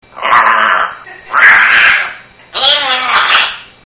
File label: growl